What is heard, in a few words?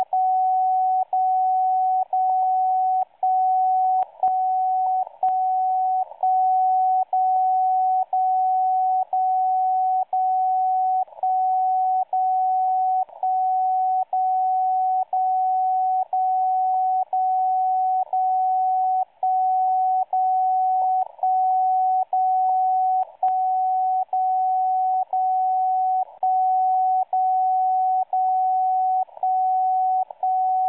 websdr recording 60khz; MSF